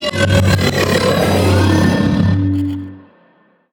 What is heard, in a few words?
Arachnoid Camper Vocal Ability; Warframe; Corpus